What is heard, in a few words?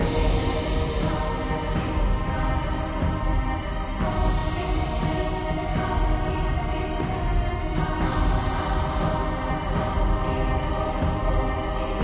Chamber Lift Chase Loop; assassin s creed chronicles india; First Civ